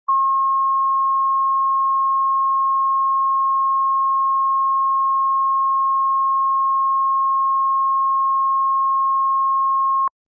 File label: test tone 1 kHz -14 dB; 44 1